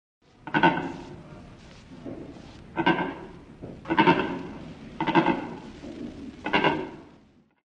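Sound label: Secretary bird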